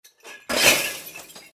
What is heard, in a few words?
glass breaking